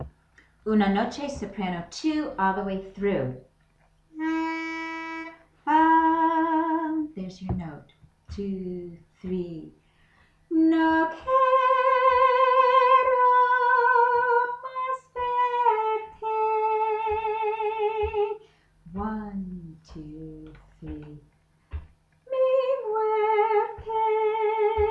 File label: soprano II all the way through